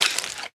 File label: agricultural tower harvesting, factorio sound entity